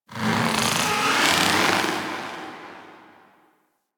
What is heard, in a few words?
Exterior Creature; Sentient Lair; Misc Creatures; Warframe; Ambience